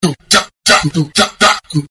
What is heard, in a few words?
beat